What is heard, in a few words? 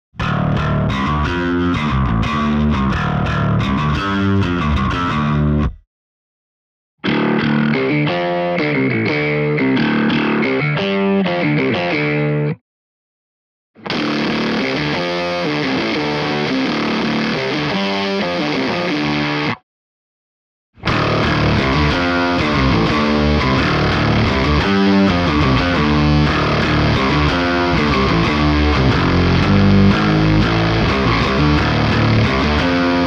Octave Bass; Octave Tech Tips